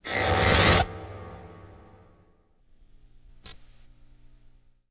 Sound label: Static Electronic Wave with beep